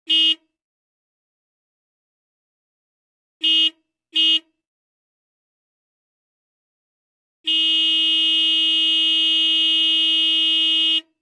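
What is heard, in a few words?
Car horn sound, klaxon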